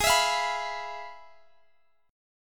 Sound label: F Minor Major 7th Flat 5th, Ab